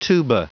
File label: tuba